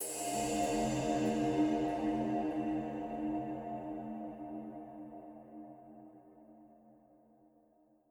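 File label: Reverb Cymbal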